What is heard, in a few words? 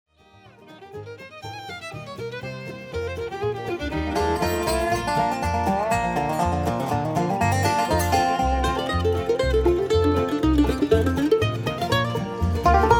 Bb Polka